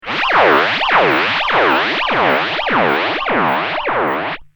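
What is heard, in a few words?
guitar, ADA flanger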